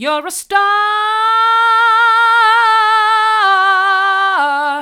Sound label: vocal